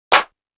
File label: emoticon